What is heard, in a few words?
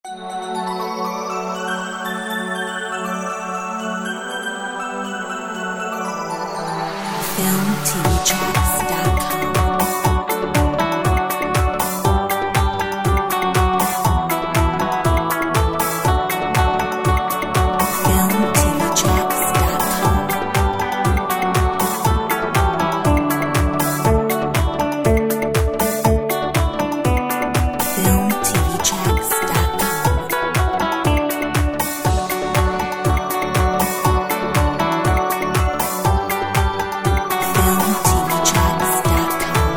Jingle Bells Pop